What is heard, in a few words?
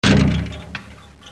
Basketball BackBoard